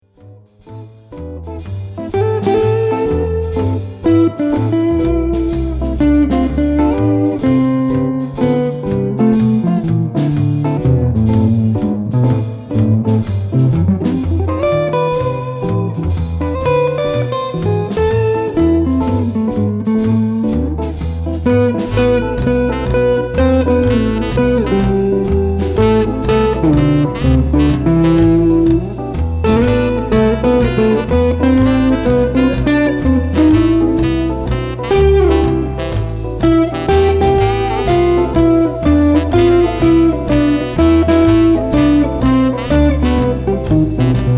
Am jazz solo, guitar

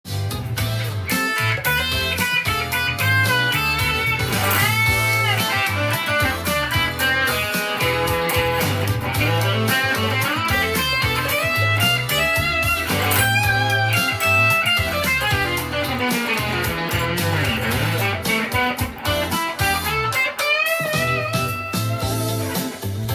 delay, guitar; japanese-made guitar effects with multi-effector; via

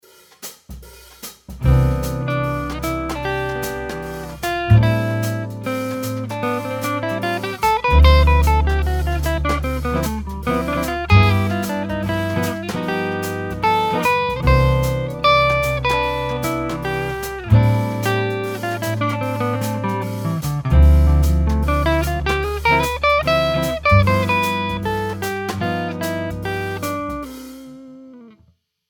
solo, guitar

